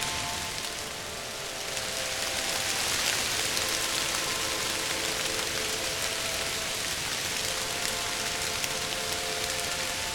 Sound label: soundscape